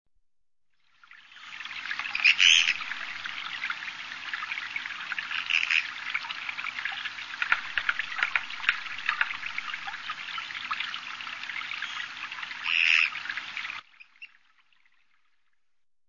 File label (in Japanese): kogera, birds